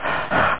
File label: Low Screa